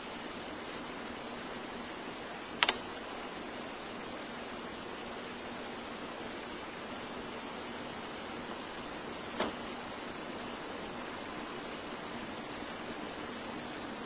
Unexplained Knock; Mahood Hall Bluefield State College; 1st Floor

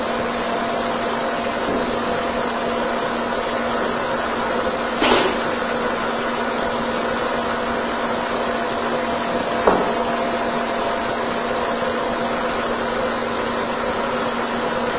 Room with Fireplace Unexplained knocks Amplified; Turner Home; 2nd Floor

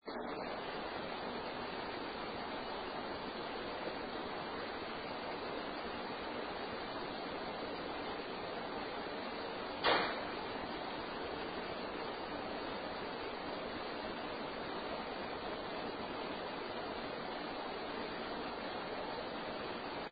Art Room knock; Case 012 Pocahontas Fuel Building 2nd Floor

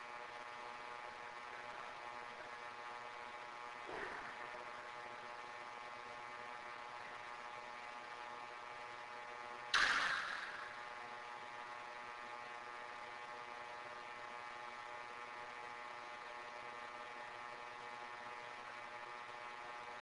Lobby bang; Case 012 Pocahontas Fuel Building 1st Floor

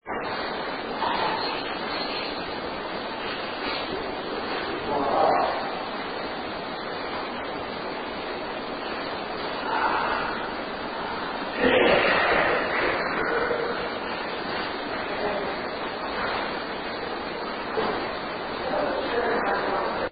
Art Room breath; Fuel Building; 2nd Floor

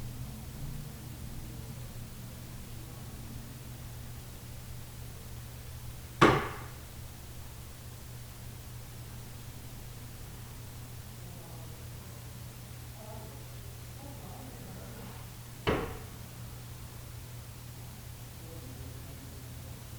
Unexplained Two Loud Knocks 2nd Floor

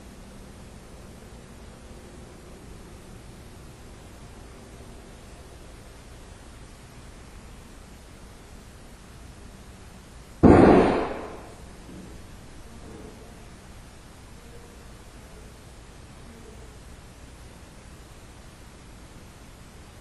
Unexplained loud bang; Case 023 Major Graham Mansion; Childrens Room; Olympus Plug

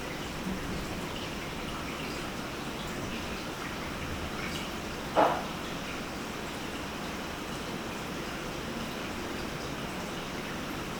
Unexplained loud knock; Case 032 Wampler Home

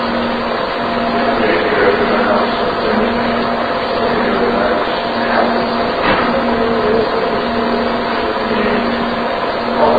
Room with Fireplace Unexplained wailing Amplified; Turner Home; 2nd Floor

